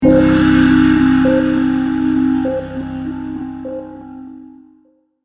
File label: deathcam, music; Counter-Strike Global Offensive; hotlinemiami